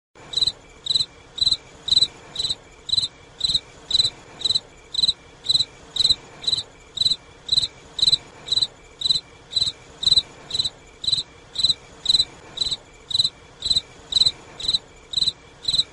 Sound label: cricket noise